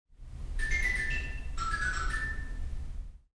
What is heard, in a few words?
Xylophone, theatre organ